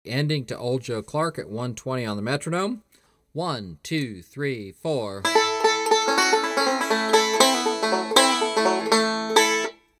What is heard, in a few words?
Old Joe Clark Ending, 120 Bpm